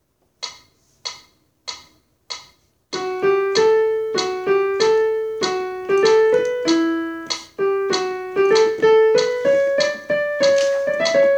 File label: Nelly Bly Soprano, Accompaniments